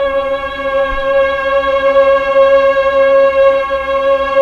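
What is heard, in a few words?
Pad Tron Violin & Choir C#5; Tron Str+Vox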